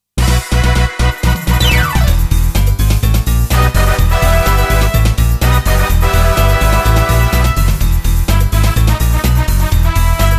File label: instrumental